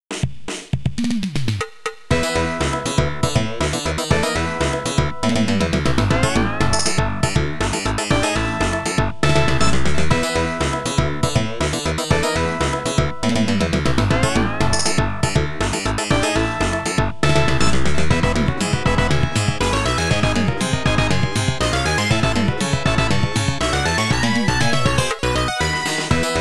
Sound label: POP; dragon city x shitei; x68000